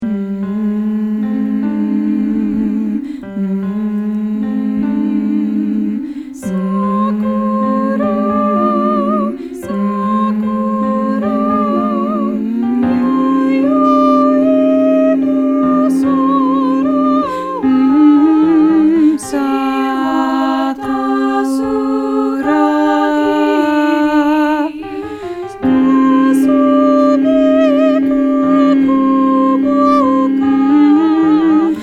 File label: Sakura Sakura Bass